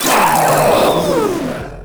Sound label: Proto Infested Runner Death; Warframe